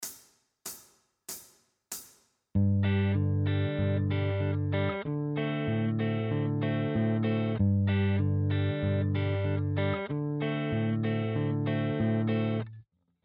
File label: Backing Track; bluegrass guitar mastery